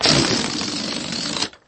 vozdushnii shar vzriv odinochnii blizkii perdyashii

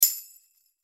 tamburin

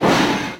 brosili s bolshim grohotom metallicheskij predmet

